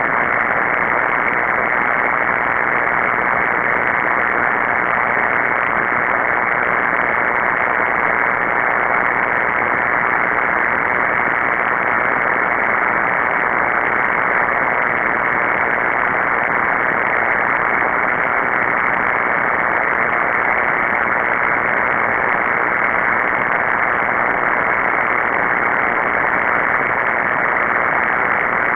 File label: Power Line Noise